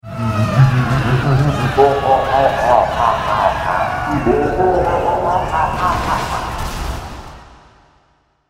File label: victory; hoseong